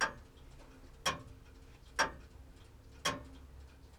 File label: clock; Spelling Made Easy